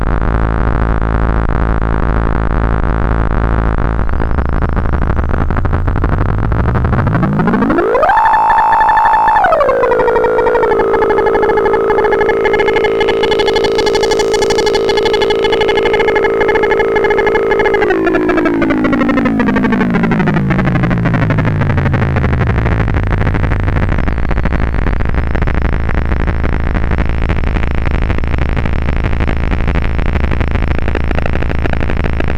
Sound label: SID mod cycle pwm